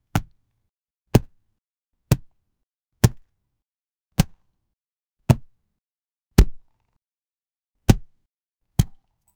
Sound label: tire bump rubber sound